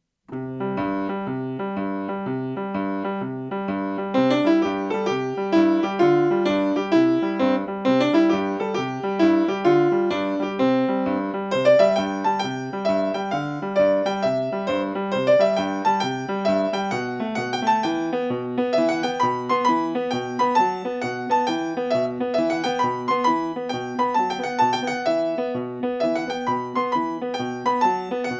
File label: Tarantella